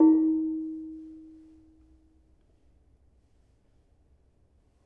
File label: Bonang Barung soft, gamelan